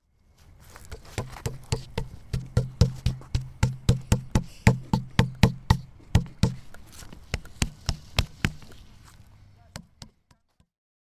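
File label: sound of hitting wood; 木をたたいた音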